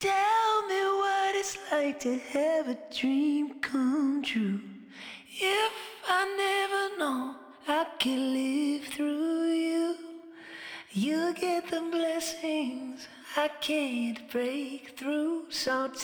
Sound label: Vocals Collage